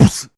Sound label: Beatbox Snare, Human Beatbox